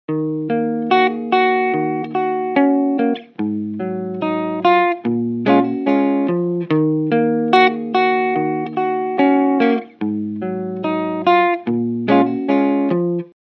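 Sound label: warm univibeish guitar dry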